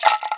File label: RATTLE, INDIAN PERC